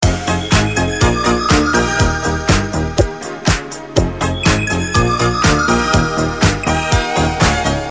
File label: Loop